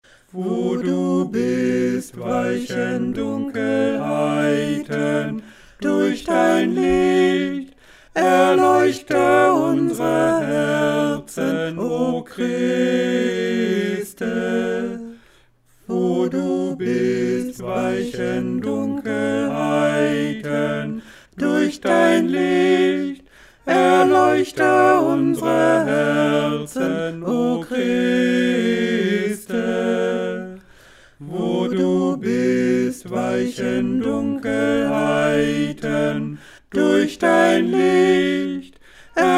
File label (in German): ohne Sopran